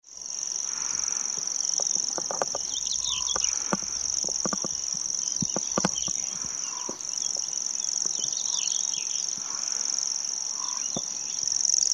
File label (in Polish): swierszczak